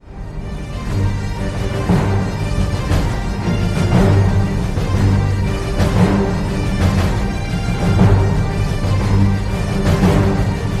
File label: Celtic Ringtone